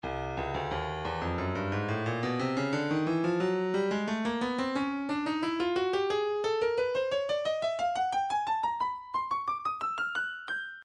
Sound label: breakbeat 089 bpm; Stylus Breakbeat Midi Files; bombadier a